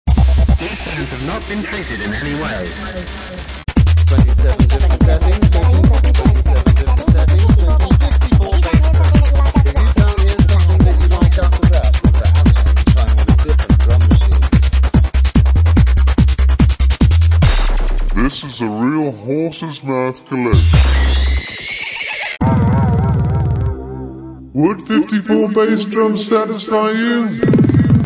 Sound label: bassdrums